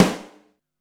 GRINDER, SNARES; Back In Time Records; Big Bang

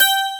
12STR, PIANO

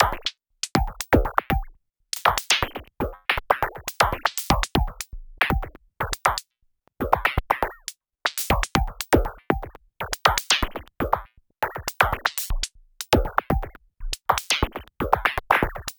Anabeat Mix, Beats

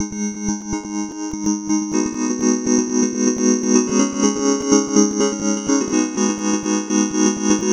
Ab 124, Synth Loops, Acidized; 124bpm; Zero-G; Soundclash Nu Dance Electro

